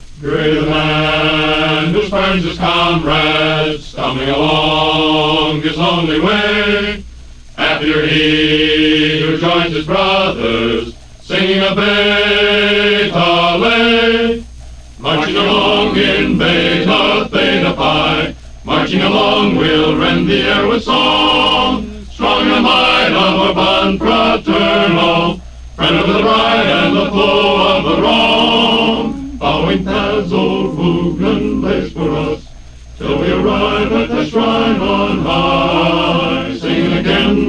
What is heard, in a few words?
Marching Along